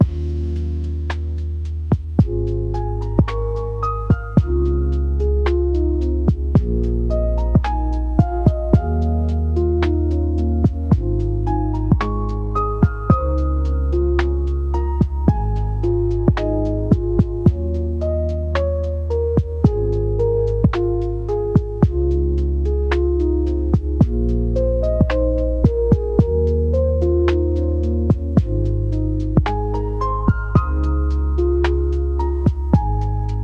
lo-fi chillout beautiful melody, dream scape melody loop